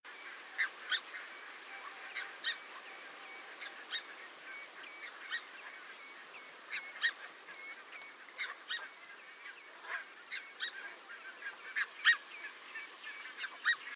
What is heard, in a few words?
red breasted goose